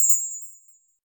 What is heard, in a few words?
Bell Shake; FREE SFX PACK VOL 2